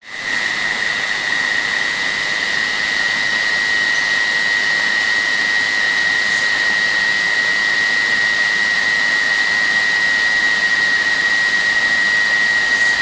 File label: nature rainforest evening waterfall creek distance insects close by; zapsplat